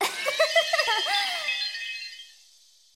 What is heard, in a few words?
succub magic; Rescue Team3